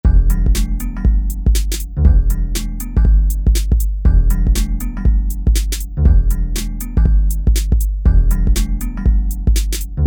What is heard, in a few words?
Rap Hip Hop